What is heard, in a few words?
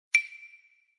glass Stick